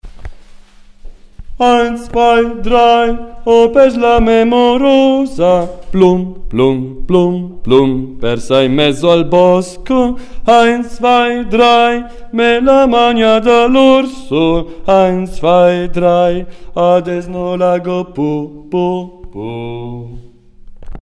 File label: eins bassi